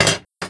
grenade hit; diekrypta

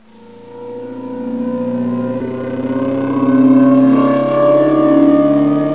teleporter on

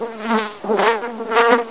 flies trash, ambience

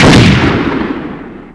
superdragon alt fire

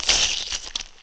crow peck

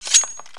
m9 bay draw, weapons, knife; csgoknife

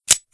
colt cock

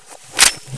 M4 to ironsights, weapons

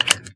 revolver insert

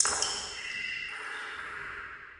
distant drip, canal; hl2

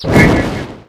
energy sing flyby, weapons; hl2

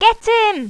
charge

